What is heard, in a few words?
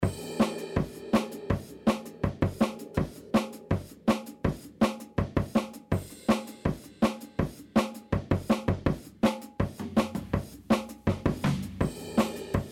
EDM